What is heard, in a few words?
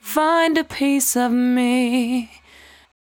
74-5bpm B, Velvet Vocal; While U Could; Zero-G